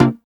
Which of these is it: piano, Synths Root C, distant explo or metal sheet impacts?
Synths Root C